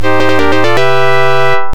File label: Game Over Music